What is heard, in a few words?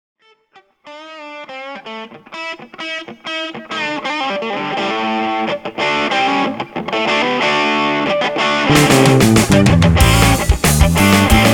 Funk